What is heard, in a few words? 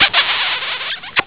squeaky